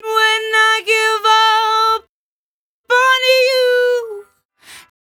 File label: 57bpm Am, Velvet Vocal; Zero-G